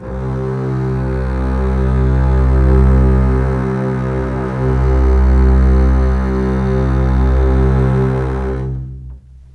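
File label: C2 legato, strings; East West; The Ultimate String Collection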